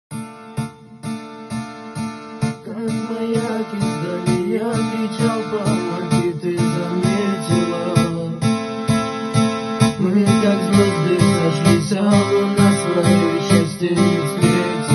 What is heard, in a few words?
arabic remix